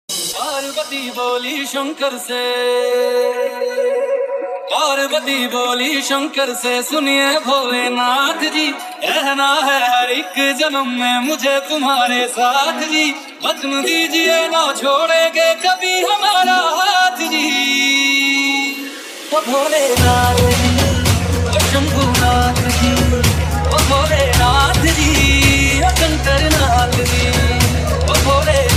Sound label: Mahashivratri Special Remix